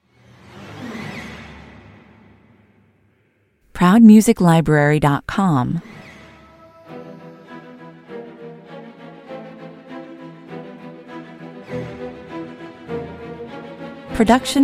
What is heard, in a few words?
no percussion